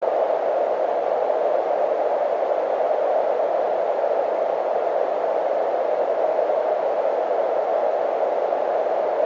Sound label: Travel Mini Sound Bright